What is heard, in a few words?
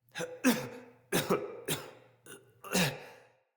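Cough Walk, Barks; Battle; Envoy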